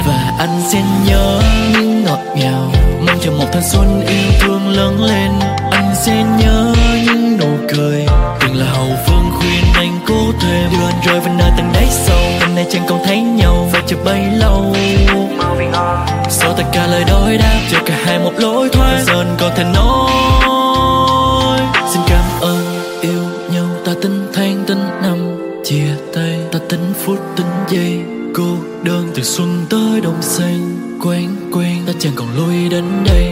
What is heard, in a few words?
Lofi